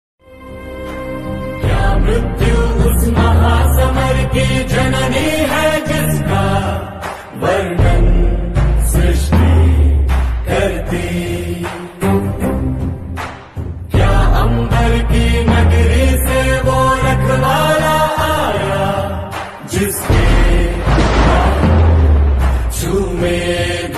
BGM ringtone, hindi ringtone; Bahubali

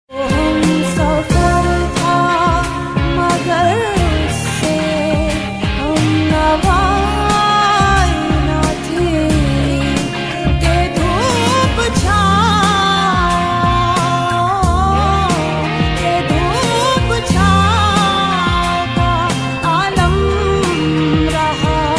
OST pakistani ringtone; Humsafar

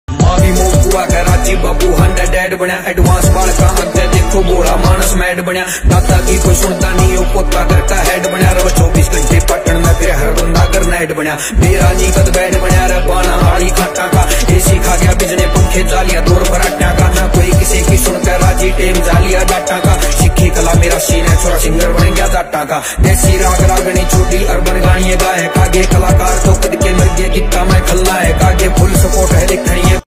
haryanvi ringtone

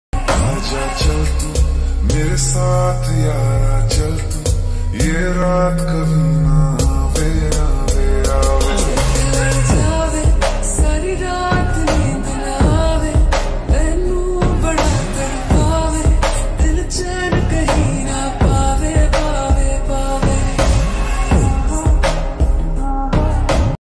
slowed hindi ringtone

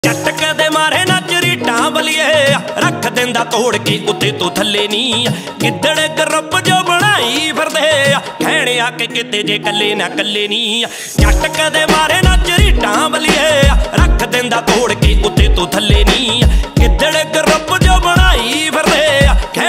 punjabi ringtone

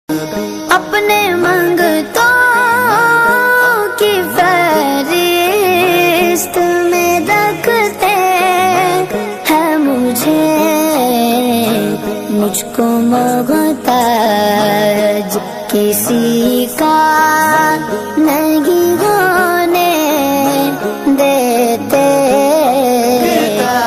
islamic ringtone

